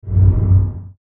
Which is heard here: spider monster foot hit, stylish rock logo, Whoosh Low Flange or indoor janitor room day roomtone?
Whoosh Low Flange